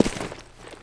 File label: concrete, footsteps